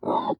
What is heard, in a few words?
pig indifferent